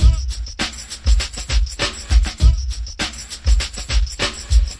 vocalize the groove, drum loops and breaks, 100 bpm; 091 to 100 bpm